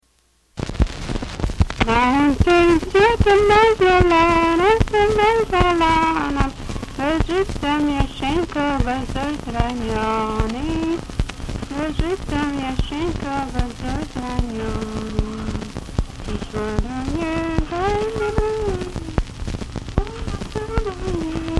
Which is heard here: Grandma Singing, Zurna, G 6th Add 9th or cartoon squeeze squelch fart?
Grandma Singing